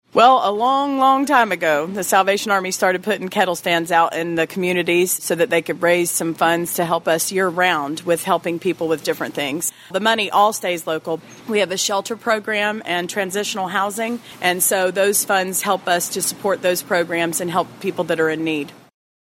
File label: Bell Ringers